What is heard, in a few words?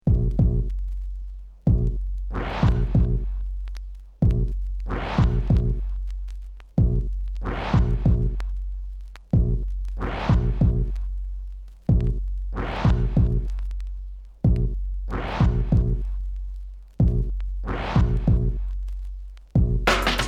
beat; wicked mix vol 013